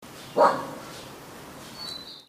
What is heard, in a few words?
dog